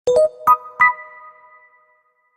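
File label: reminder, sony ericsson phone sounds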